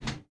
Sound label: metal; The Sum of All Fears